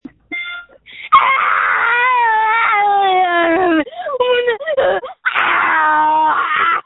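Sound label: Scream, phone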